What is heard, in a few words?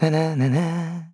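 Vox Hum, Korean; Evan; kingsraid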